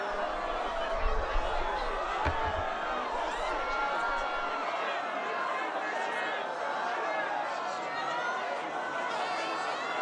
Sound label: under siege, ambience; pf2e pfs07 year of battles spark; anthusis